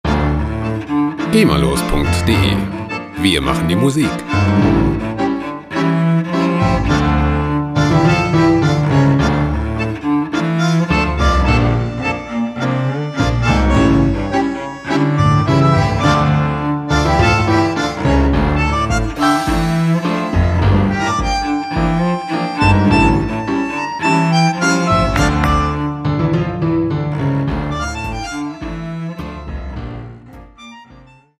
tango

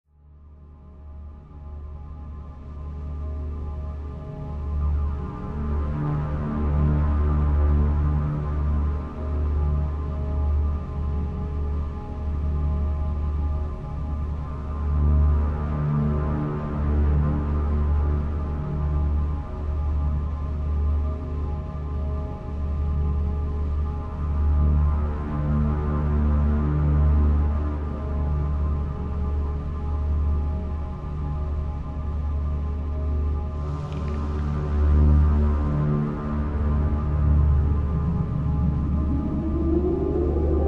atmosphere

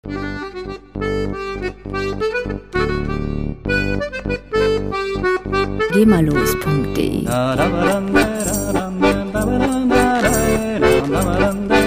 bourree

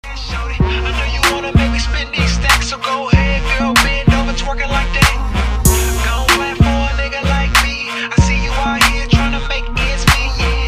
trap

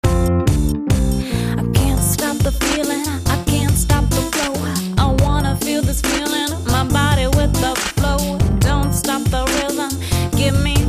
groovy retro

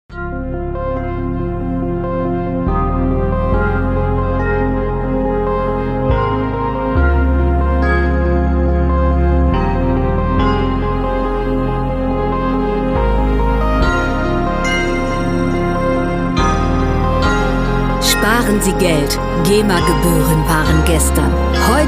rain

